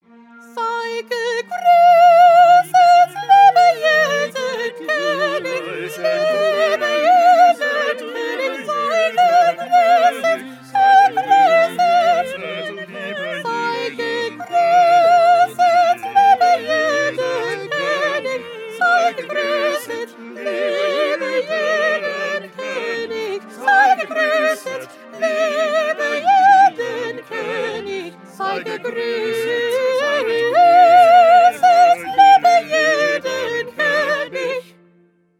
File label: Soprano; Bach; St John Passion